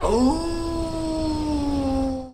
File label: awoo